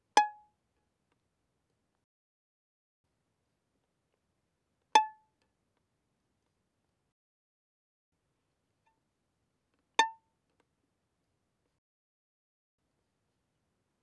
Violin pizz mf sul E Ab5 F6

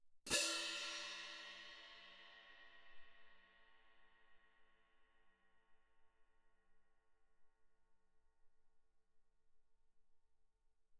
15 orch crash, mf, crash cymbals; bandaturka; Percussion

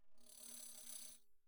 tambourine finger roll pp; Percussion

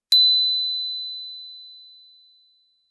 bells brass ff B7 stereo, Percussion

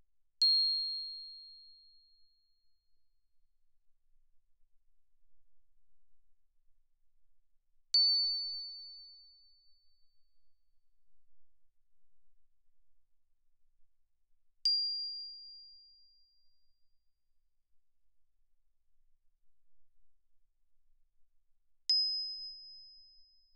bells, plastic, ff, C8 E8; Percussion

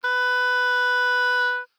Oboe ff B4 stereo; Woodwinds

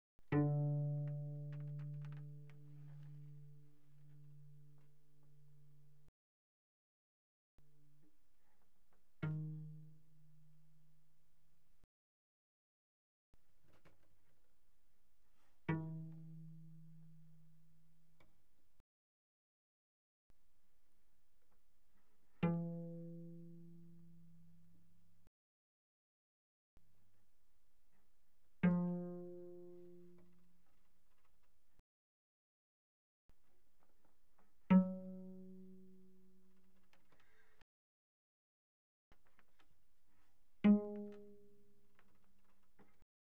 Cello pizz pp sul D D3 B3; Strings

